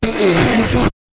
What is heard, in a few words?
jingle